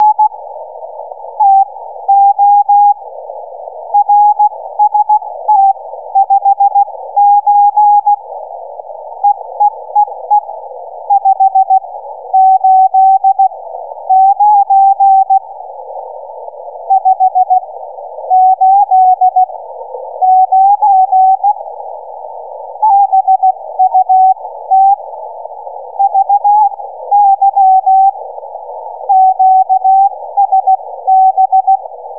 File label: chirp